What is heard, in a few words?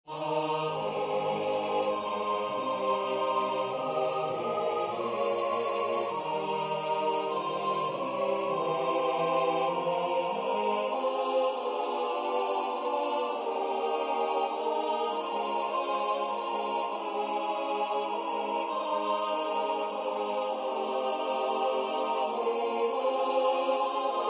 Hymn SATB